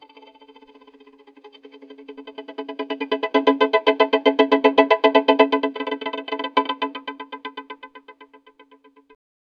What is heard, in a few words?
violin accent